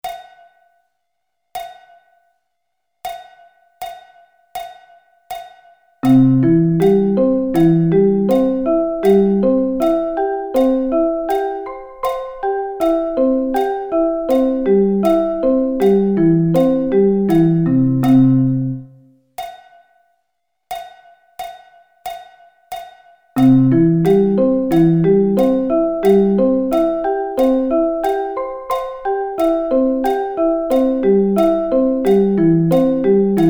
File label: C Arpeggio Inversions